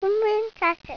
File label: lil sound; purim